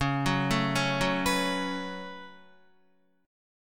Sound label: Db 7th Suspended 4th Sharp 5th Db down Guitar; JGuitar